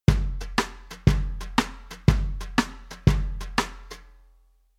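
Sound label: Rhythm Country pattern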